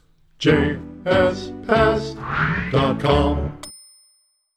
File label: Pest jingle